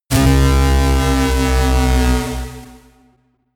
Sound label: bass drop slow short